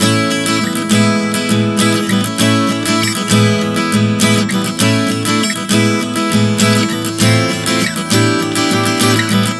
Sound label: Progression 100 A-E-F-G, Acoustic Guitar, Chord Progressions 100 bpm